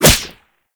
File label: Whip Impact Default; melee; weapons; Oraxia